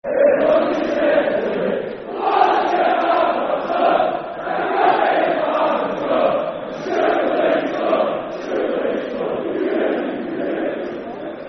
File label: When I Was A Little Boy Football Chant (Soccer Song); Bradford City Bcfc